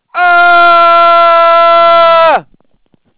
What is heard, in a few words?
AHHH